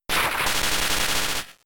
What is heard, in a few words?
Thunder Wave